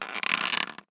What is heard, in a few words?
Office Chair Creak; Creaks n Squeaks; soundbits